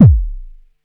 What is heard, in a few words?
Axxe Kick, ARP Axxe Drum Machines